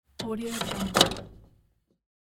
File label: pinball plunger